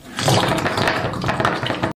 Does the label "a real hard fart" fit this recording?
Yes